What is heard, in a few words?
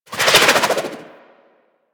SMG Projectile By; Weapons; Warframe; Tenno; Sundial